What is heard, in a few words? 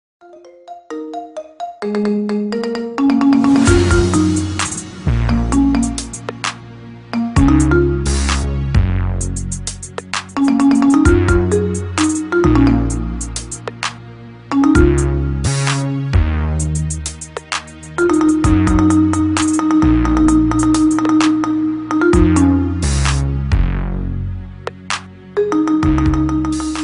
marimba ringtone remix